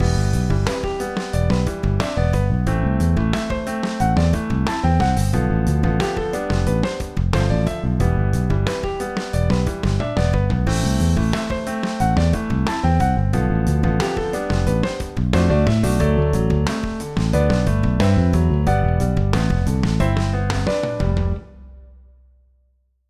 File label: Slip Trap